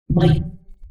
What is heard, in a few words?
micro off